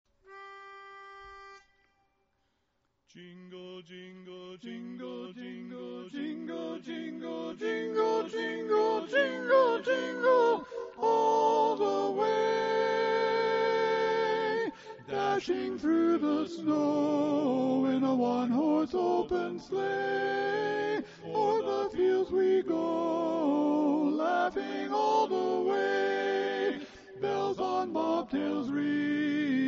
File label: Jingle Bells Medley Tenor; xmasaudio